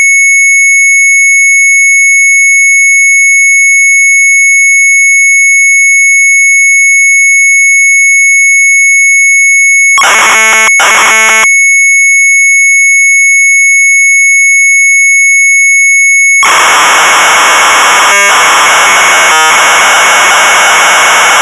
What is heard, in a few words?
MZ800 Tape, sharp; PUZZELKW. LD; retromelsarchive